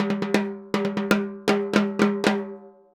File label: Timbali, Latin Percussion